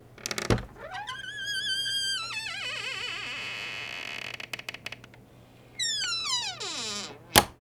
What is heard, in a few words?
Squeeky Door Household